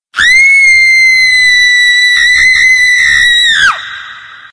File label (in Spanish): grito mujer lejano